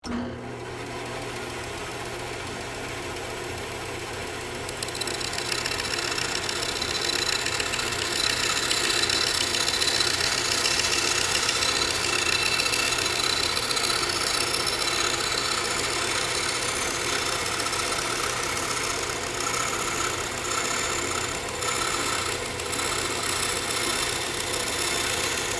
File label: Band Saw Cut Foam, Industry, Core, Slow, On, Run, Off; Motor Sound Effects; BlastWave